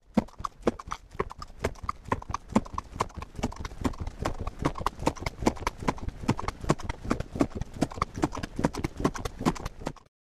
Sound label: Horse running galloping in the wild